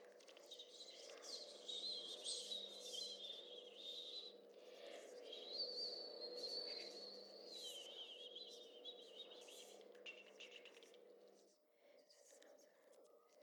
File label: swamp whispers; Oak; animal filters; New Play “Oak” by Terry Guest, directed by Mikael Burke